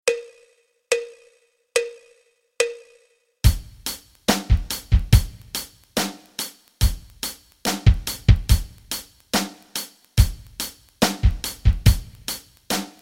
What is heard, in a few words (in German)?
rock rhythmen